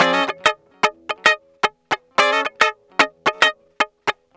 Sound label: Guitar Loop; Ambient Grooves